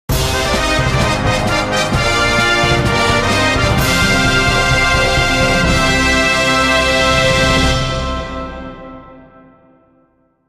jingle

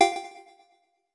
cursor

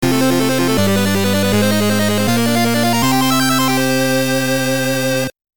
8bit Victory

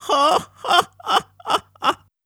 voice laugh old

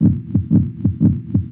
HeartBeat fast loop

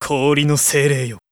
voice magic ice

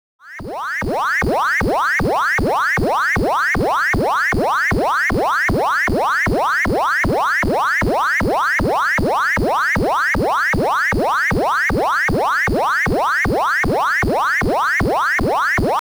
charge

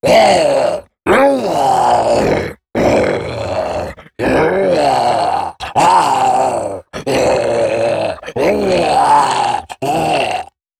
zombie attack

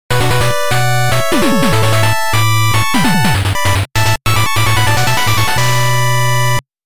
8bit gamestart

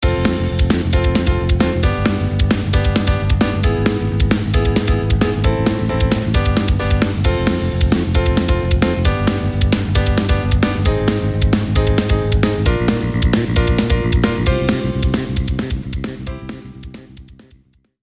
rise

